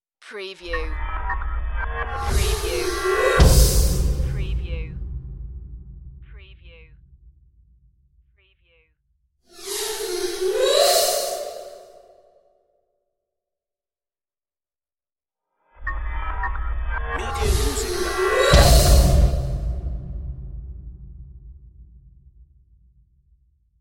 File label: eerie impacts